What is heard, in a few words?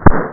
BANG